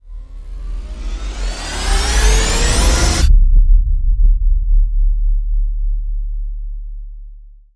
Rise Short Build to Ruin

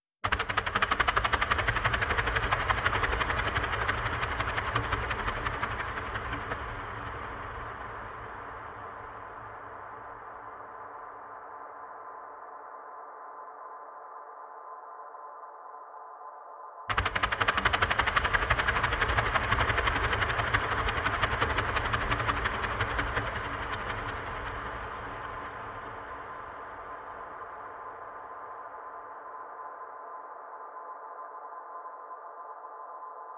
Lead SFX Only

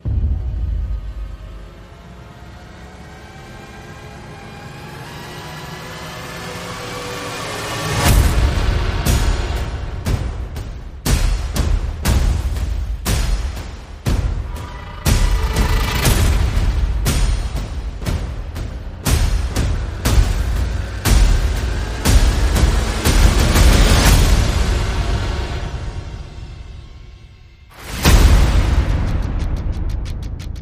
Percussion and Effects

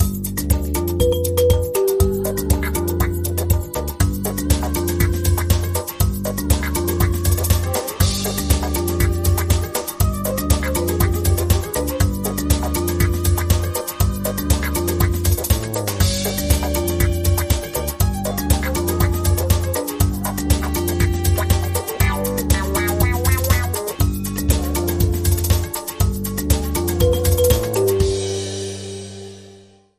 Bollywood Groove

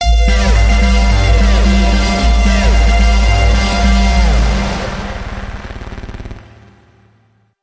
Jump Dub Sting

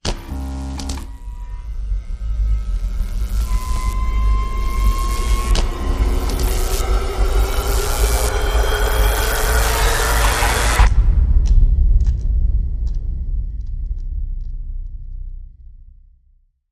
Nightfall Flicker Sparks Rise

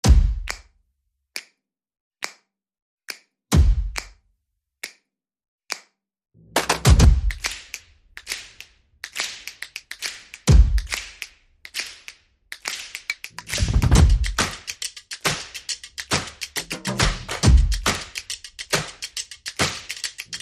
Snapology, Light Percussion

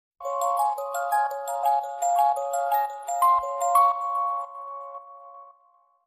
Sting

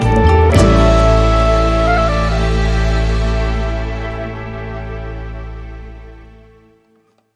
End Hit

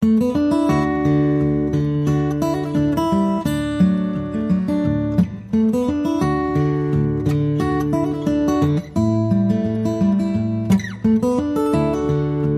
Relaxed Finger Pickin 2

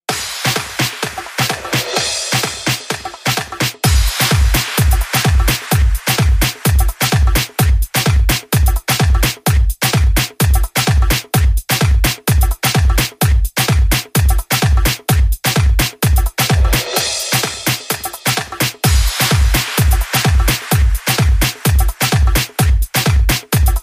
Douchebag Beat

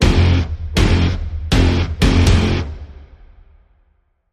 Stab Guitar Phrase (F)

